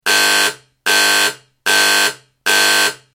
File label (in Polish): ton